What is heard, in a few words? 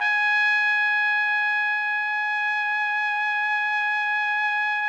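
trumpet; Roland - LA Composer vol. 3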